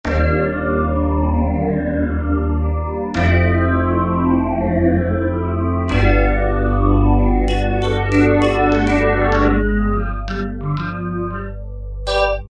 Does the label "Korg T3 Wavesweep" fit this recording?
Yes